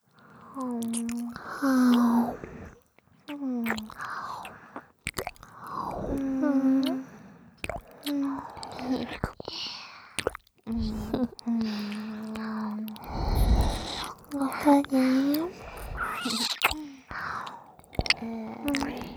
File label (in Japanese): ディープキス＆右耳舐め; ディープキス＆耳舐めオンリーオナサポトラック